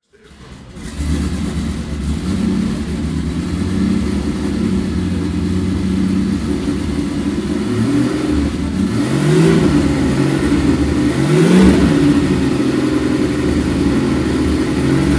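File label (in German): Porsche 935, Porsche 935 - Starten und Hochdrehen; Ton 1978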